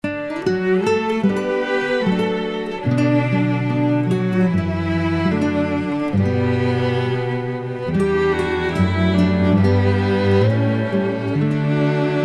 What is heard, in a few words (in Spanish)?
Banda Sonora Music Dramatic